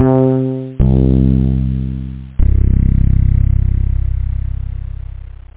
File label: Fretless Bass